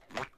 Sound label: walk